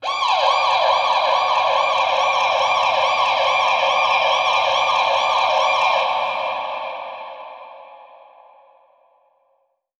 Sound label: Echo Proc A, alarm siren samples, 120bpm; musicradar